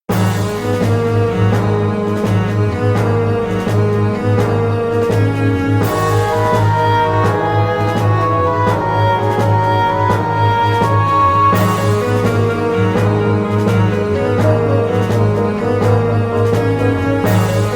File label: questioning (moderato)